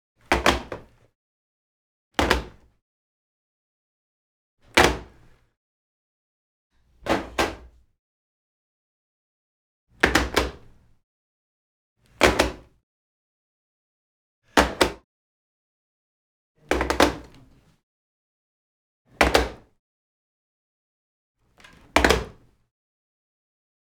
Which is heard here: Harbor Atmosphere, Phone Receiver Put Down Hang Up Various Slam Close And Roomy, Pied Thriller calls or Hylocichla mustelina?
Phone Receiver Put Down Hang Up Various Slam Close And Roomy